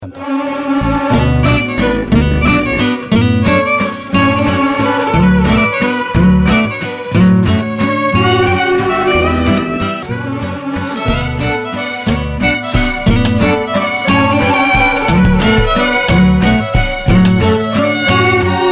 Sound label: Love BGM Ringtone